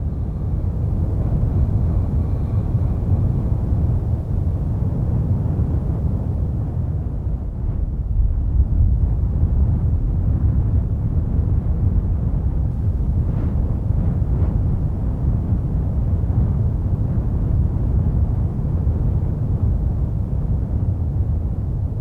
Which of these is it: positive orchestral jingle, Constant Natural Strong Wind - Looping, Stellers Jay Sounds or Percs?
Constant Natural Strong Wind - Looping